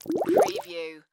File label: Bubbles Surface Short; sound-effects-bible